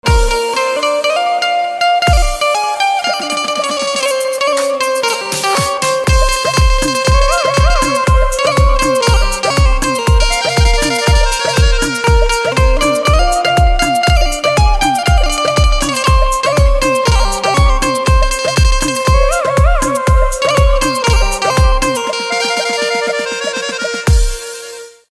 Banjo Instrumental Ringtone

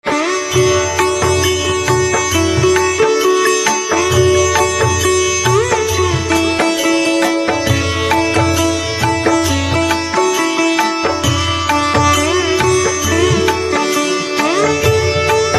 Sitar Instrumental Ringtone; sitar and veena

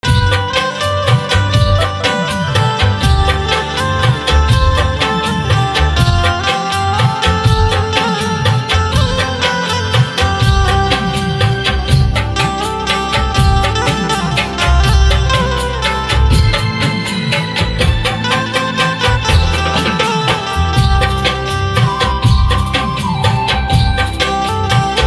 Banjo Instrumental Ringtone

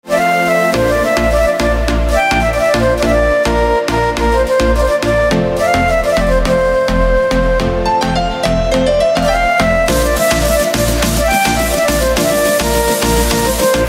Organ with Guitar Instrumental Ringtone

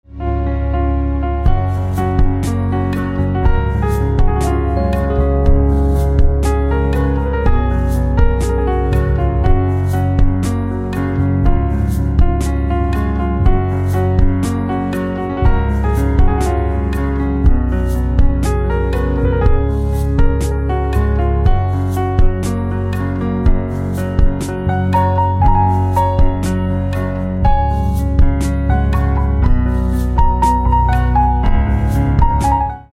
Piano Instrumental Ringtone

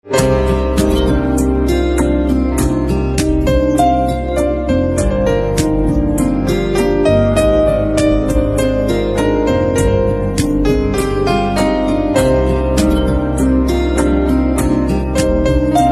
Piano Instrumental Ringtone

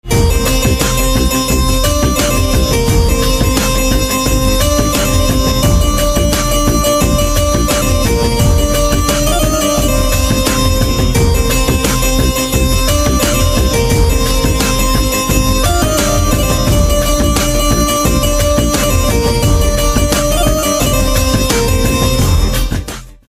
Santoor Instrumental Ringtone

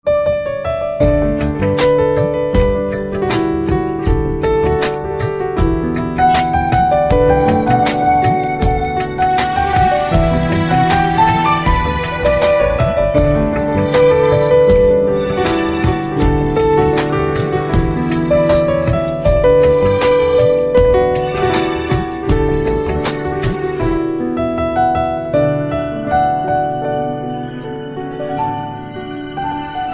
Piano Instrumental Ringtone

